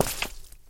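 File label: active short close gulp of water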